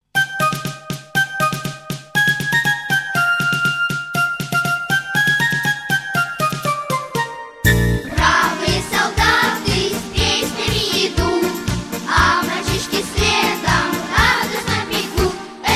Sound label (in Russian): Детский Хор